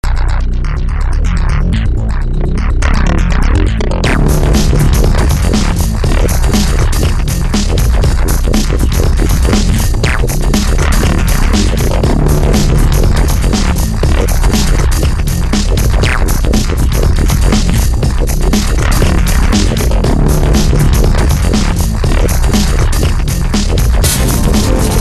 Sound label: talking ARP